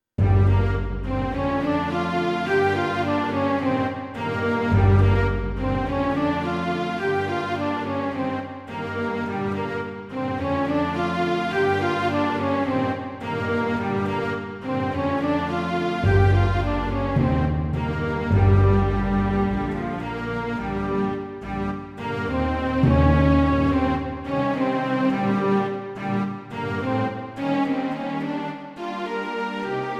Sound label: Blues Rock in Gm Small Orchestra 106